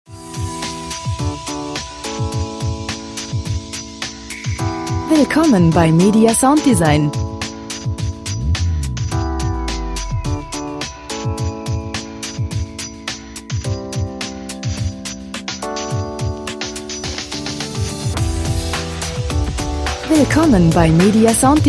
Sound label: percussion